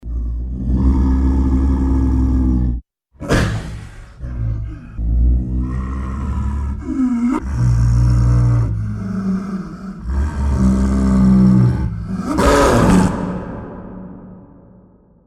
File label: leopard